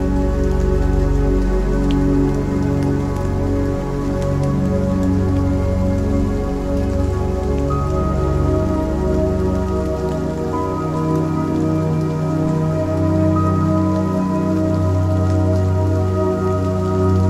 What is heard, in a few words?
Silent wind meditation Zen Music